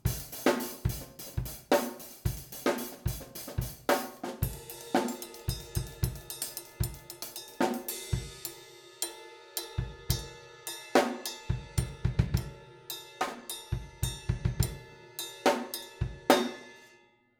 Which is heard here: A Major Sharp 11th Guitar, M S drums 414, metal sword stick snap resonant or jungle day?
M S drums 414